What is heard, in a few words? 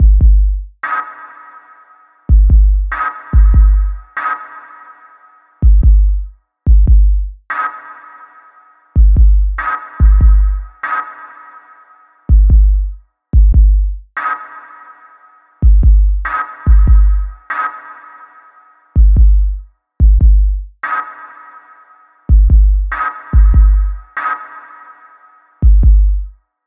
music